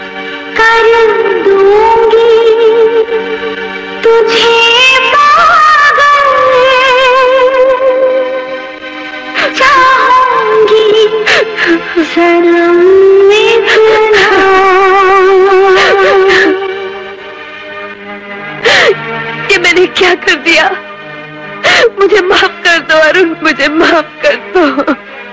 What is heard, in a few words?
beautifull tone, ringtones